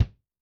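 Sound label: bass drum, Kick, Yamaha DTXpress-II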